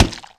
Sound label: Cake Plop, cake drop